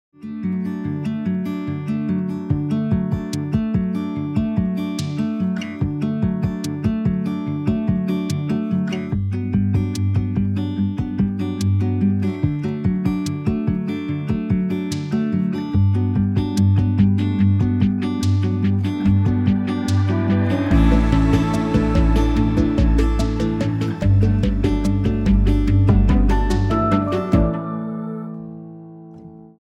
Instrumental

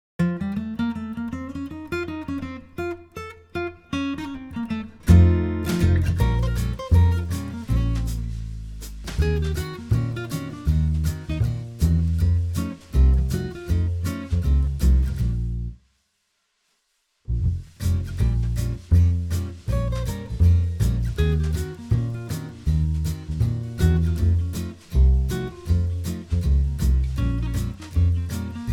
Jazz

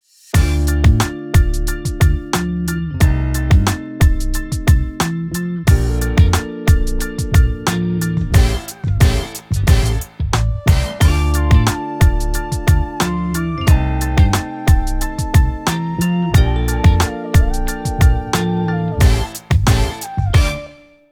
Clean Rap Song instrumental

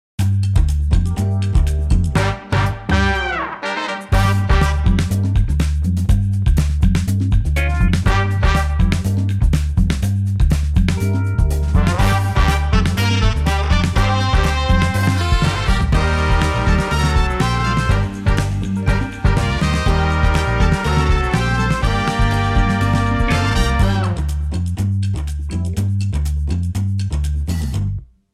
Brass Groove

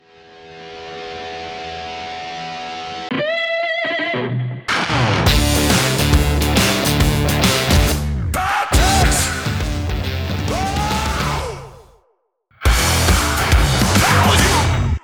Concrete Rock

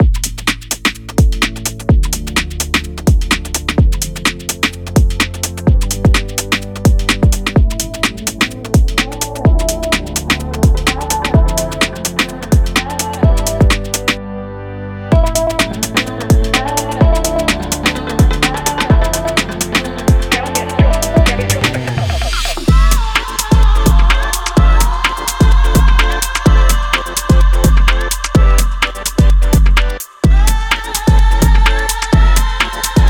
Activating Beat

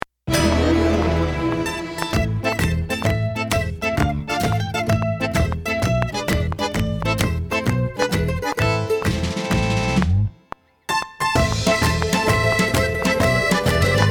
Italian Ambiance

